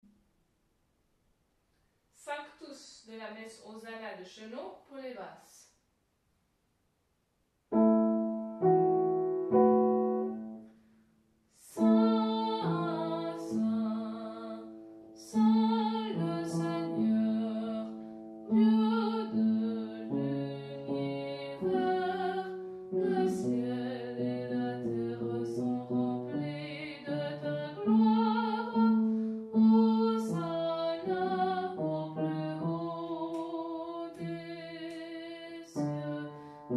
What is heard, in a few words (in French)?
sanctus messe Hosanna basse